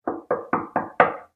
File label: Door Knock